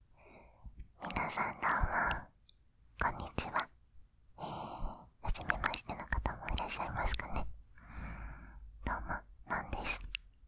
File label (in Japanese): 両耳から耳奥まで耳舐め, ASMR